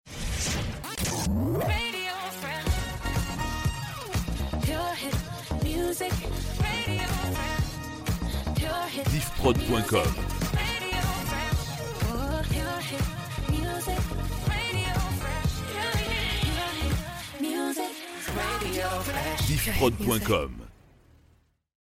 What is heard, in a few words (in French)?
Rampe Hip Hop 122bpm chant